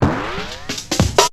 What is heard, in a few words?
filter roll